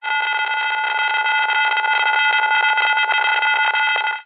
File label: science fiction radio static interference randomly pulsing whine